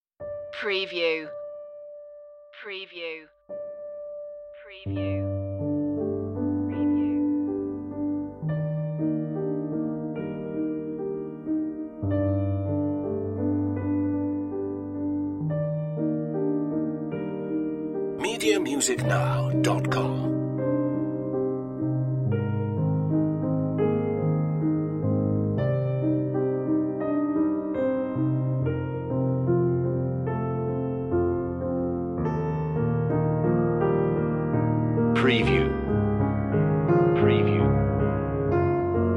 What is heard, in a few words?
piano solo